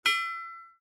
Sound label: metal cling correct